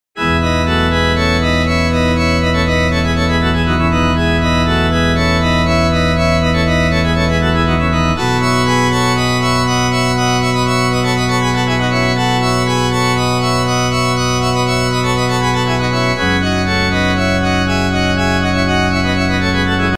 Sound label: Dog Nose Fanfare